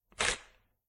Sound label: tear